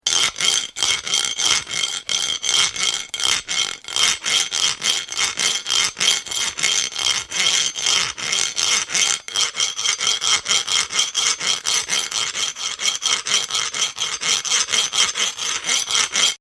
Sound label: Metal File, Metallic grating of a metal file.